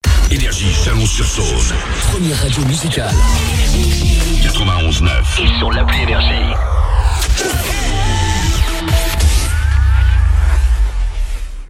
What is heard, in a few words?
JINGLE